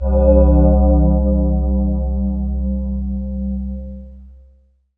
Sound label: SYN SY GLAS1, Roland; Sound & Vision - Gigapack I CD 2